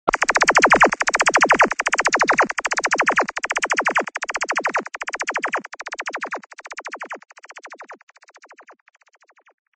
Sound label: Laser Crazy Shots